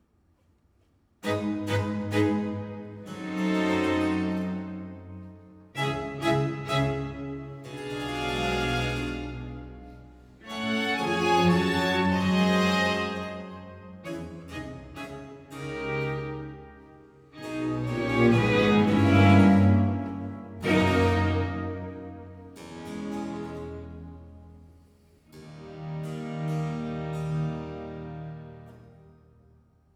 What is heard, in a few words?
Concerto Grosso in C major; Corelli